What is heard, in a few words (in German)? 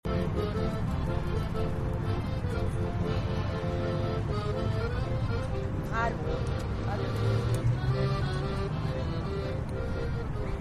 strassenmusik